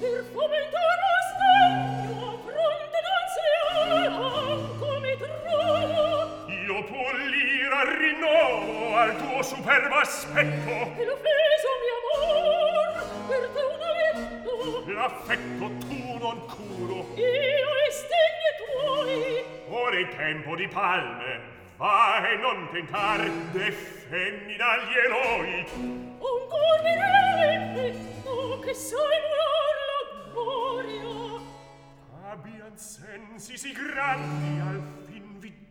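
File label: Recitativo, Handel opera; Act 3; Per fomentar lo sdegno; Handel - Rinaldo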